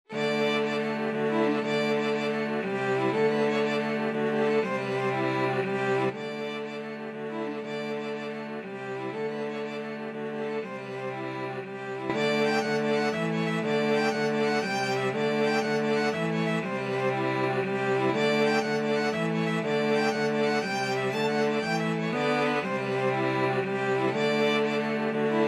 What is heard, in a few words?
violin cello duet